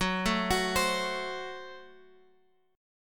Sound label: Gb Diminished Guitar Open G